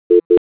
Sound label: sound confirm